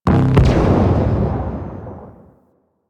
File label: Laser Shot; Warframe; Ambience; Rail Jack; Pluto Charon Region